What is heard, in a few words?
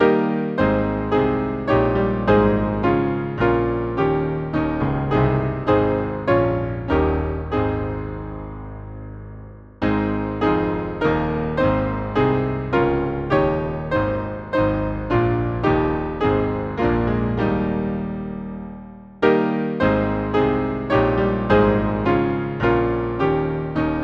Hymn, piano, St James; Thou art the Way to Thee alone